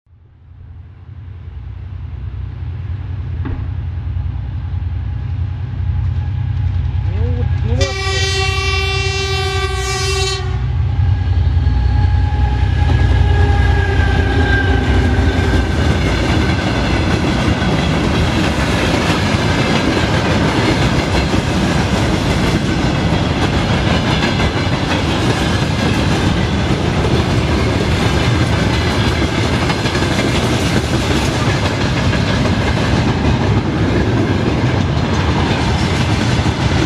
zvuki vokzala

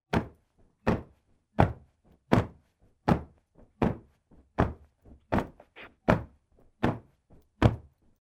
zvuk podushki

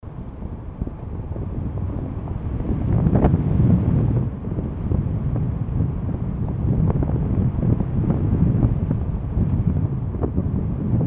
zvuk vetra

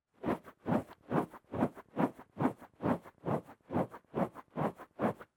skrebushhie zvuki

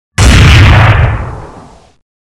zvuk vystrela

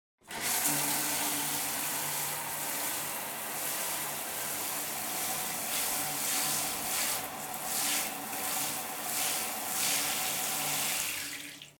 zvuk dusha

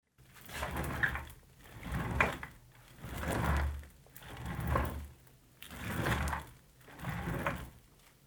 zvuk kresla

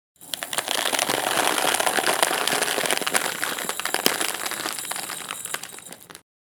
zvuk peresypanija

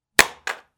zvuk broska

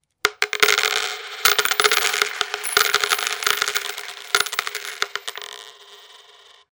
zvuk melochi

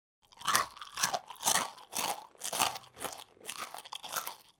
hrust suharej

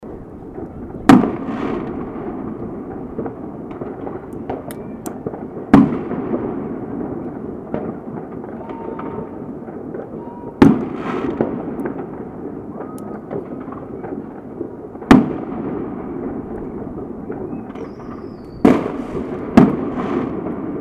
zvuk fejerverka

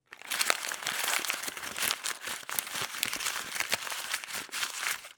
zvuk shelest bumagi

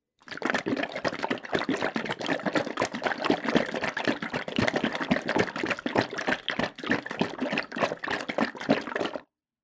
bultyh

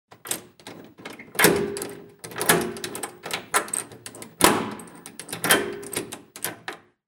zvuk otkryvanija dveri

